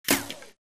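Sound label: bullet light ballistic titan ricochet; Titanfall 2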